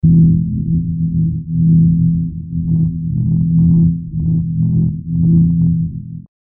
drone hollow

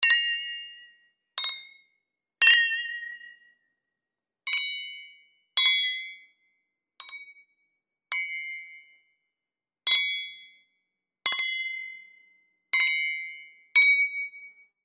glasses

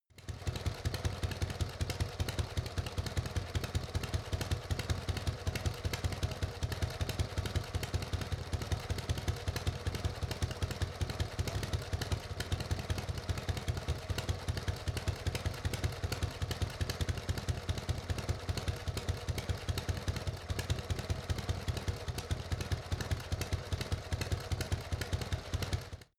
motorcycle harley engine unsteady idle rattle

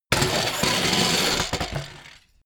bmx bike grind metal

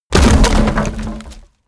impact medium

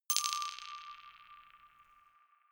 notification glockenspiel rattle single low

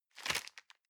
plastic bag small grab soft

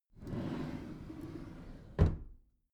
packed drawer smooth close soft

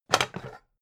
pan stack hard

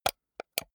computer mouse wheel click slow hard

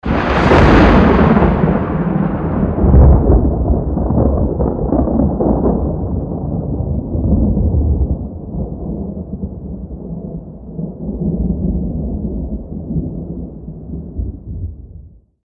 thunderclap med

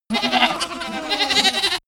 goat baaing goats

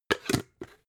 jar glass plastic close cap

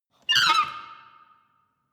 window screech reverberant fast

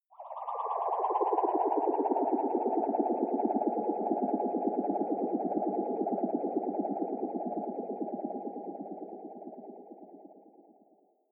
ufo classic wobble down low

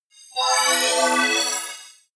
appear sparkle positive

